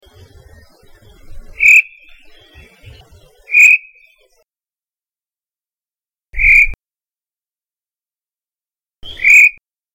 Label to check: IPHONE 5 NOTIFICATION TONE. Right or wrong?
Wrong